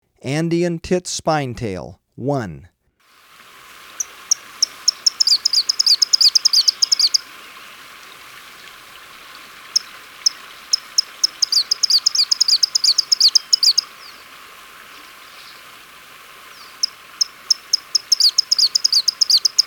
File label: Andean Tit-Spinetail, Birds of Ecuador; S. America